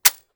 mech, 40mm; gmod; arccw uc commons attachments

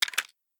pistol rattle; gmod; arccw uc commons attachments

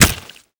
sharp light; tfa starwars melee weapons pack; nmrihimpact

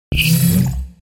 sweep; tridroidznnpc

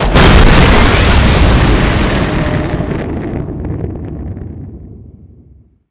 boost; gmod; lfs v wing v 19

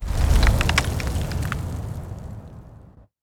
debris; gmod; arccw uc commons attachments

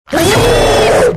death; nexu; valkyrie npc pack; fallenlogic

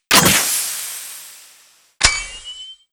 pistols; tfa starwars reworked shared ressources and assets

